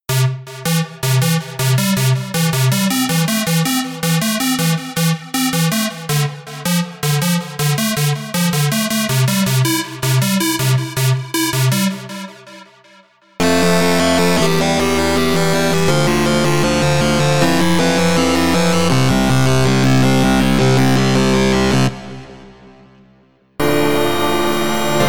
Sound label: Polysynth